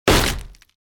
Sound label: Cartoon door breaking wooden